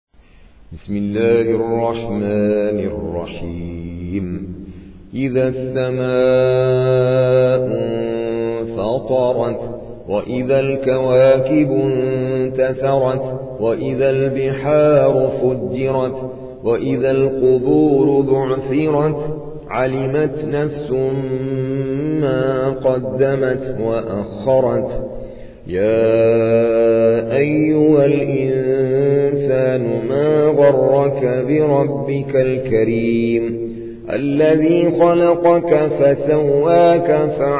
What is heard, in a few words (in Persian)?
ترتیل قاری هندی; سوره انفطار